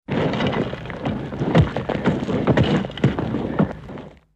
crack; Sexy Witch 4 The Dungeons and the Bungler

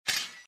trap close; The Witcher Hunt

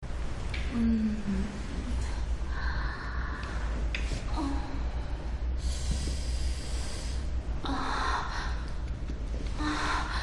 moan, moans; The Witcher Hunt

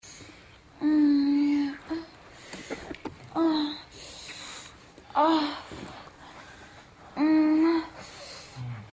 moan; Low Blow

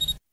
pic sound; Nude Camera

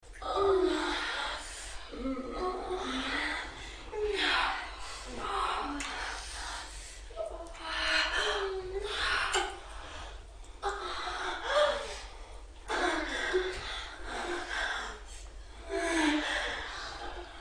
sex, moans; The Witcher Hunt v.1011

